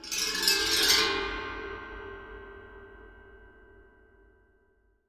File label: despair